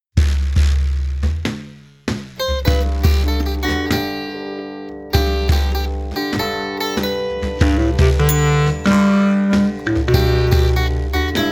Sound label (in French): Version instrumentale